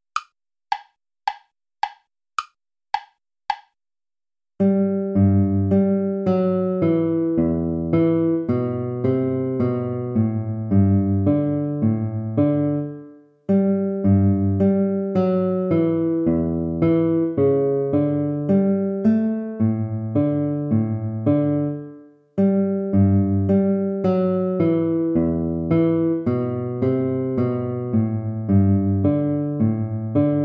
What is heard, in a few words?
gavotte play along; haendel georg friedrich